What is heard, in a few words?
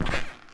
skweak